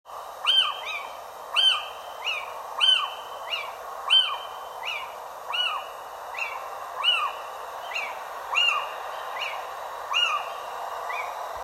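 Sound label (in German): Steinkauz Ruf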